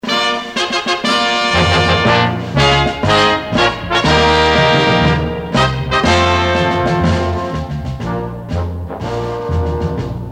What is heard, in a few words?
Music Bed